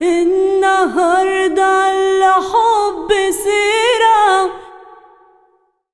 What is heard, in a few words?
SINGER, Arabian Traditions